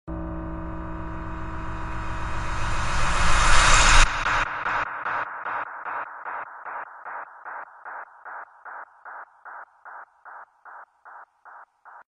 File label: Scary Tones